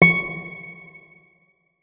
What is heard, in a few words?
notes, guitar; Hideout Furniture